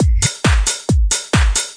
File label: 135 bpm, in my house, drum loops and breaks; 131 to 140 bpm; samples-ghost